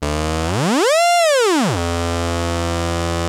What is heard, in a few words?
ARP 2600 E3, synthesizer; Hollywood Edge - Giorgio Moroder Rare Synthesizer Collection